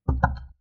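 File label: wood door knock other side twice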